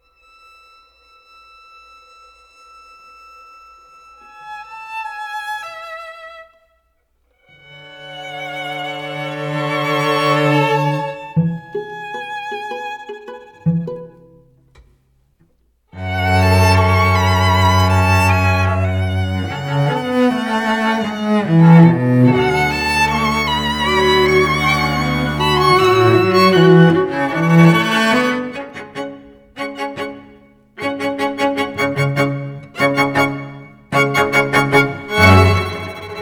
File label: Humming Street intro